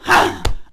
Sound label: cudgel attack; khanat; megaglest